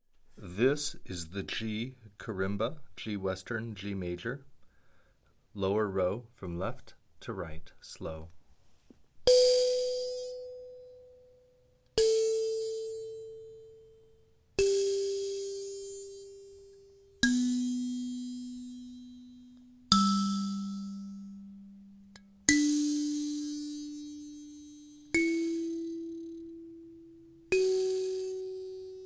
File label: karimba G